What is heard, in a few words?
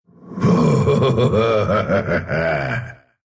laugh, ru; dota2